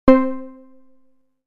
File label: Three Pulses, synthlead